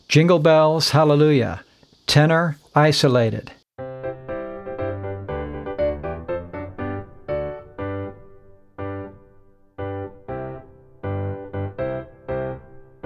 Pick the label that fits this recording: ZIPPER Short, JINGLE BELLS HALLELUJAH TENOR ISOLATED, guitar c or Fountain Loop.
JINGLE BELLS HALLELUJAH TENOR ISOLATED